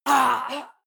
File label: male groan deep pain short; menschen; schreie